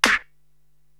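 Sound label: snare drum Rap, Drum Machines, Korg TR-Rack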